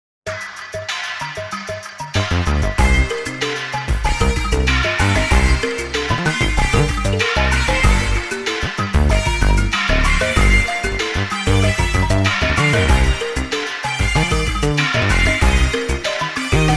Steel Beat sample

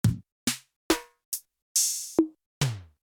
ELECDRUMS

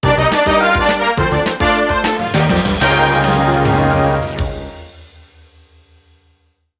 Battle Jingle; KFD

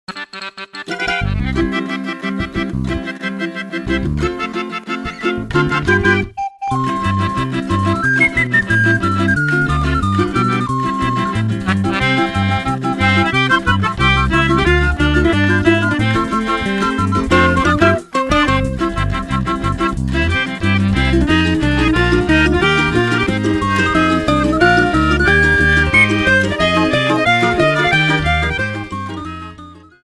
Drum Dash theme sample

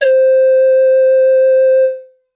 Pan Pipes

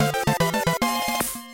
Kirby Dance fanfare; KCC

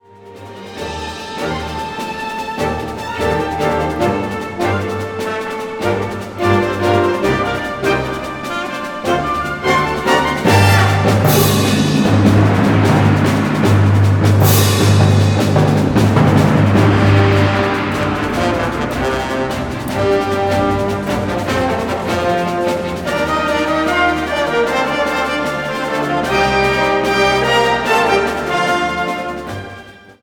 Orchestra Concert

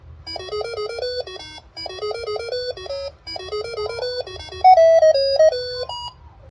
Alarm Clock; RHYTHM Kirby; Kirby Dance

